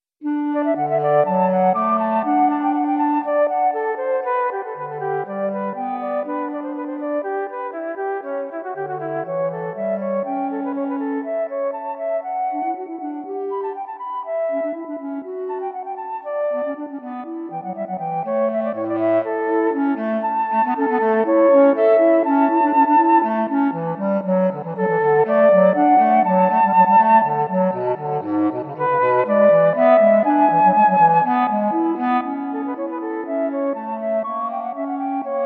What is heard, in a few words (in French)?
bois; bach johann sebastian; 15 inventions a 3 voix